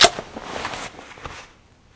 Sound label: Inf Weapons Foley; Red Orchestra Ostfront 41-45